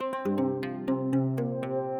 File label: chord C